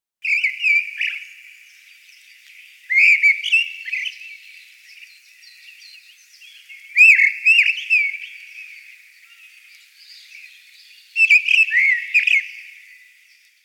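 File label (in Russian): deryaba golos, mistle thrush voice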